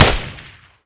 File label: Skorpion Shoot; Modern Weapons Pack